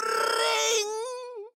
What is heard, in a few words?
brrring; Junkrat